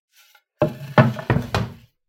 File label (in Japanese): まな板を置く音